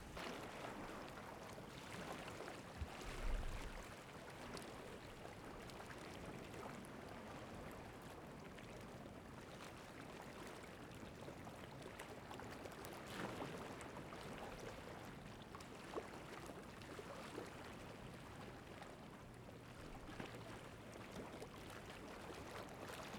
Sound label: Water Trickle Rocks Lo; Poetry Project